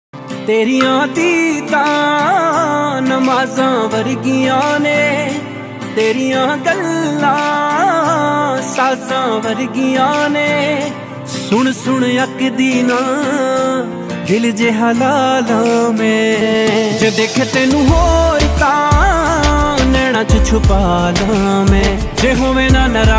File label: Ringtone